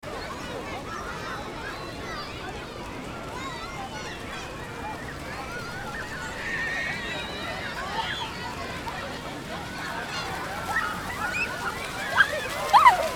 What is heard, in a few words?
Kids Urban Water Park Outdoors, Children Play At Water Park; Children Play At Outdoor Water Park In A Large City .